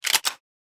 Shotgun Pump; Shooter

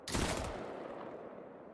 Medium Weapon Shot

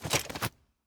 Rocket Launcher Unequip; Items; Shooter

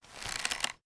Bow Pull Back

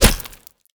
Bullet Impact Sand

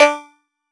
roulette

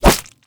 Bullet Impact Flesh

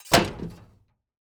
Shield Impact

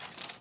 Weapon Equip

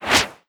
dmg fly

